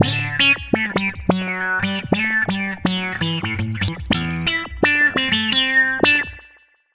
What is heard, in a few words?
Wah Wah Gtr